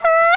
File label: cry; BanG Dream; Anon